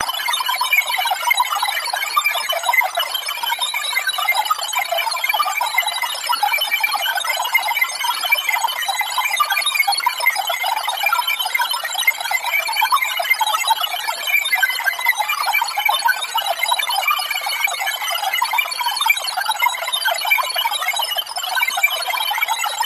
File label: Robot Computing Hi Pitc, communications